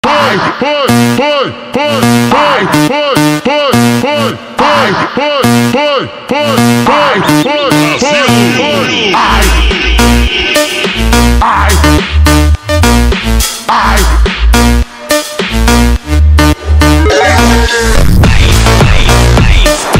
super slowed reverb brazilian phonk

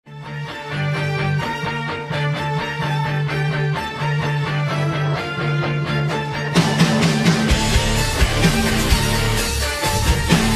slowed pitched reverb

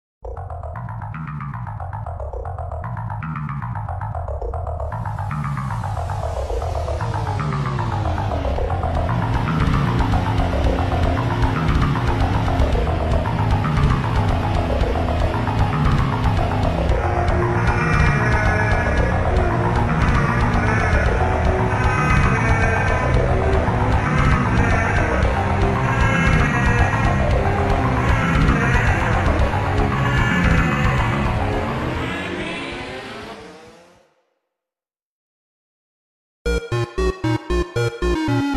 slowed reverb 8d

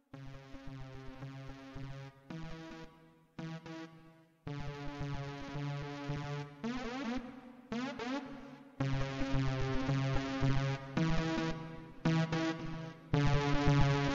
slowed bass boosted

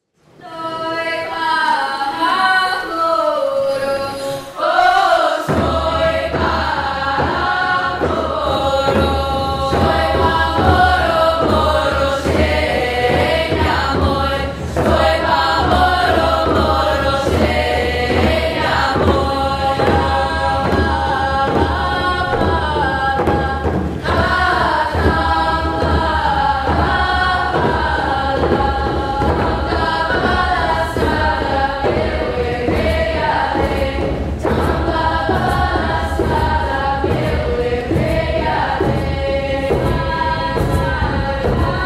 belarusian folk song; sztoj pa moru; voena

